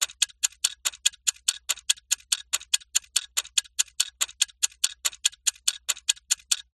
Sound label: household clock ticking; zapsplat